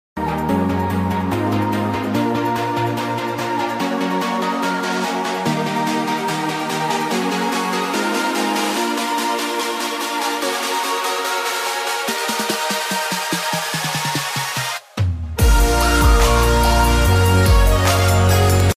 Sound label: outro song